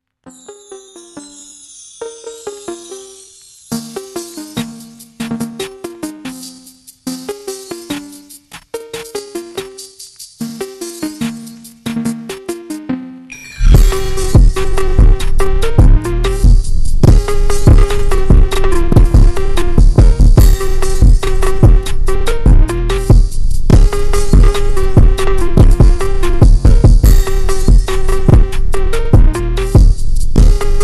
Phonk Drift New Year slowed And Reverb Songs Remix